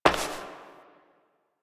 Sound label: Step Glass; Warframe; Koumei